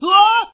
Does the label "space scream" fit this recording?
Yes